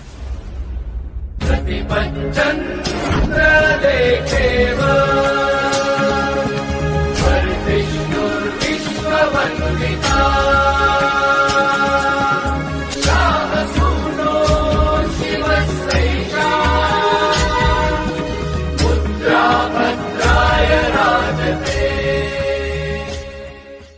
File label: Marathi Ringtone